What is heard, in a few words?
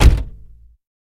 Chevy Corsica Door Close, Interior